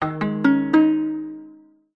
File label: multimedia game sound plucked accoustic and mallet generic tone